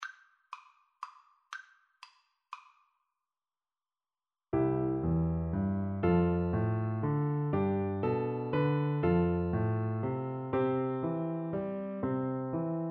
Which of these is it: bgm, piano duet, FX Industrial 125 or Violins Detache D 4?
piano duet